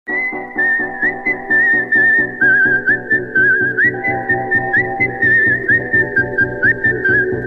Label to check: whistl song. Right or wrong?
Right